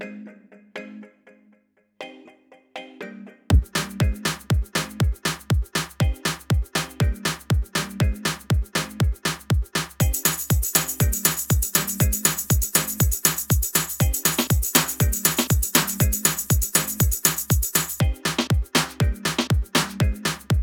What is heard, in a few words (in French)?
Rythme Kadi1 Electro; Maison du Geste et de l'Image